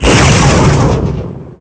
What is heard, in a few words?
missile; planecr